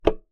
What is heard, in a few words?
Key Noise, Melodica